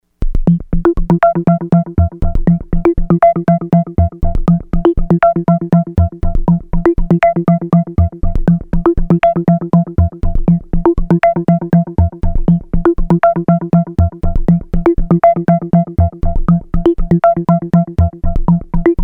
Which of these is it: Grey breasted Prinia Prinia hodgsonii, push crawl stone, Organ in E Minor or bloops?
bloops